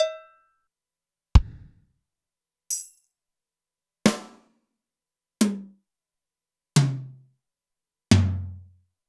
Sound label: Zoom MRT-3B Kit; Sliced